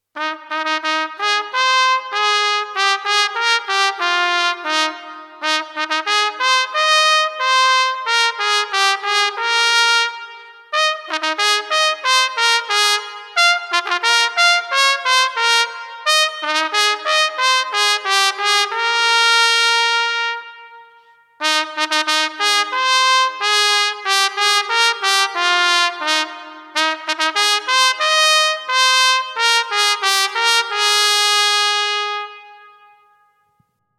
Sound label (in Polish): hejnal jedna trabka